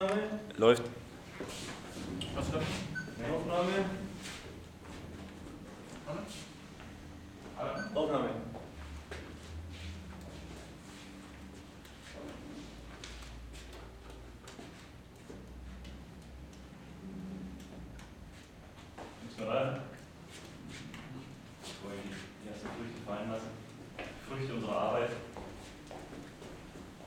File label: Juggling